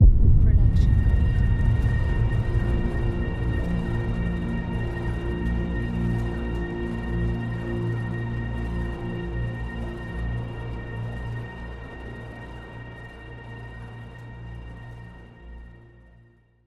Suspenseful Ident; soundscrate

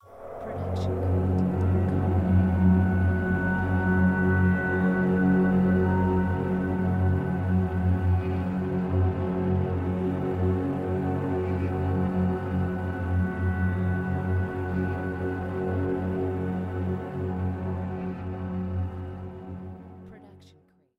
Suspenseful Ident; soundscrate

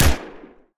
Action Gun Shot

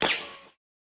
bullet impact ricochet

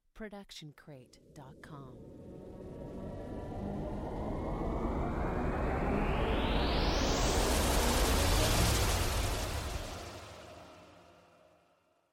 Cinematic Riser

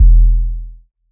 electronic kick long; soundscrate

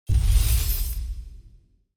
designer scifi mechanical servos; SoundsCrate

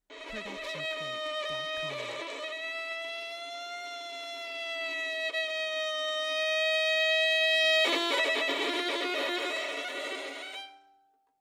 Violin Scary Movie

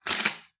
scissors

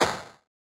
8-Bit Gun Shot; SoundsCrate

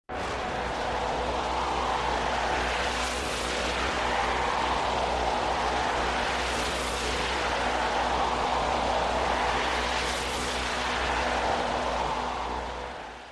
magical winds; soundscrate

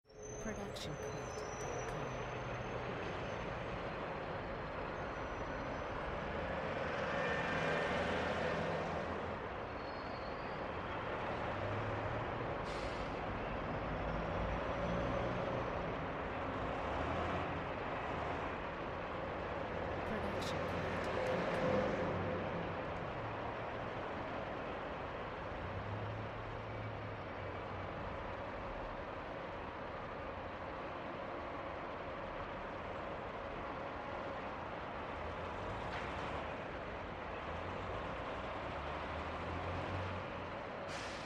bus stop with distant traffic

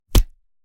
Fight Impact; SoundsCrate

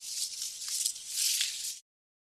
Leaves and Twigs Rustle; SoundsCrate

